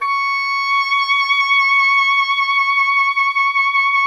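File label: Wind OBOE C; Miroslav Vitous - Solo Instruments VOL-2; Solo lnst. II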